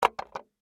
Object Set Down, Foley, Object, Set, Down, On, Table; Set Downs